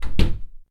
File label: door close; Golden Crayon Sounds